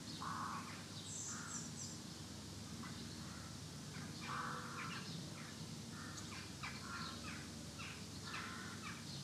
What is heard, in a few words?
Ambi Loop, outdoor samples Ambience Loops